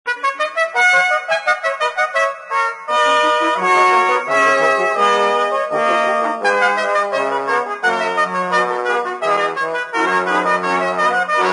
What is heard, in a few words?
fanfare